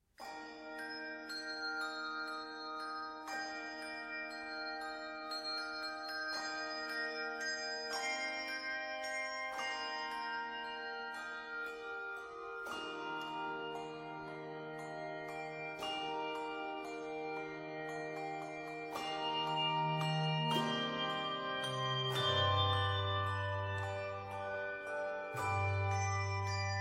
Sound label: Music box; grieg morning